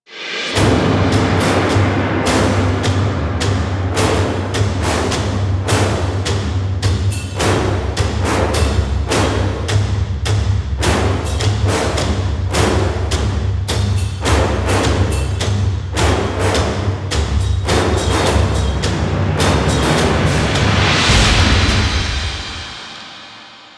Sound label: Game End You Lose, Music; Warframe; DE; PVP; Lunaro